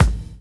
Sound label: BD, BASS DRUM, 70's Breakbeats